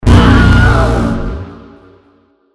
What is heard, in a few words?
Player Death